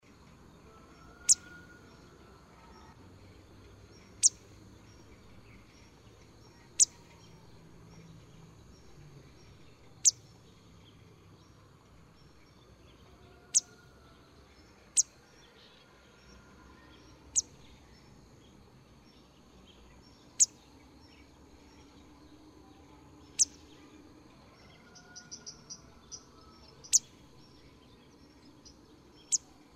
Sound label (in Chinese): 灰鶺鴒; 鶺鴒科/冬候鳥